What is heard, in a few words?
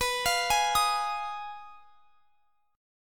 B 6th Flat 5th B down, chord